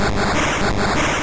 Lick; Pokémon SFX Gen 1 Attack Moves; RBY

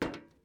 metal step

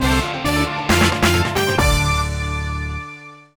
sting; Buzz! The Mega Quiz; PS2

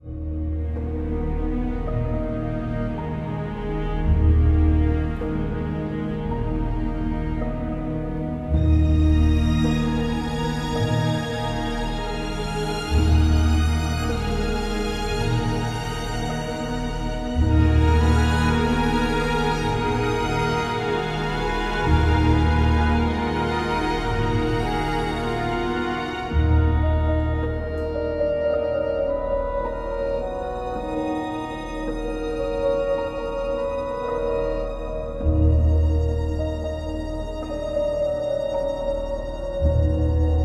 Night Mission In Venice; assassins creed ii

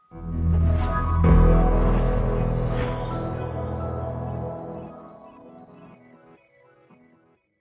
Completed; Rocksmith

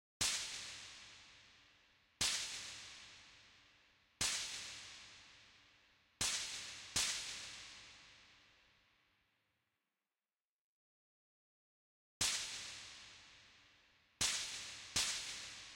SNARE

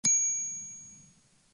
Diamond Sound

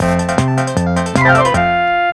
Mini-Game Results; Mini-Game Jellyfish Smack; Crash Bandicoot series

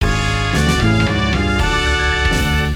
specialmission success

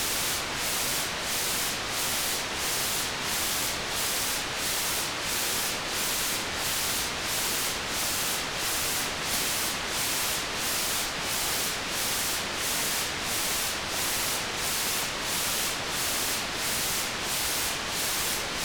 sweeps

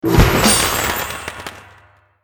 World Dungeonstage Accomplished; Angry Birds Epic

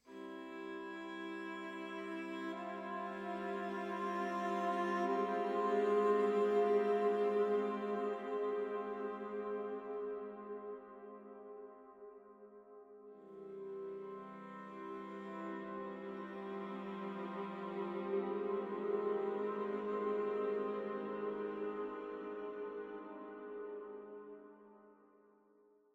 Exploration Mesa Strings further

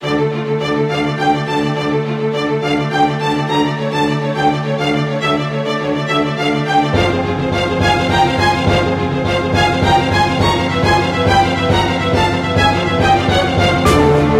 Dungeon; Infinite Links

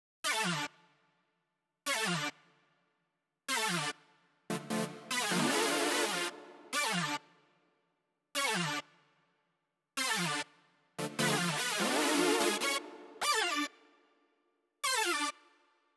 Frantic Mix Stem Loop Layer; Atari

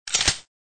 pistol mag

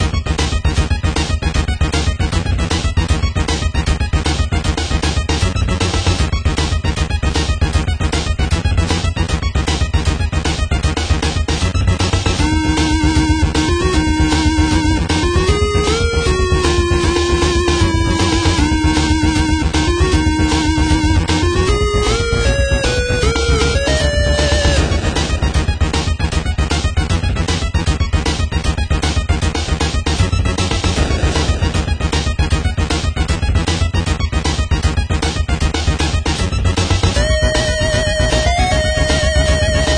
Aggressive; Super Metboy

